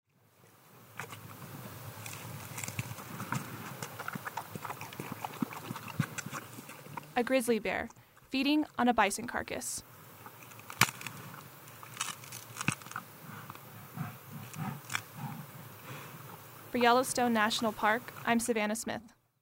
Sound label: grizzly eating; sound and light ecology team